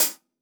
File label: HH, HI HATS; Basement drums vol 2